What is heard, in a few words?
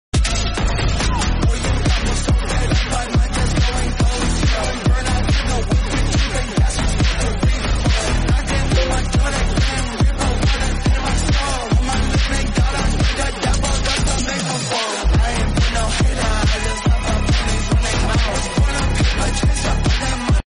drift phonk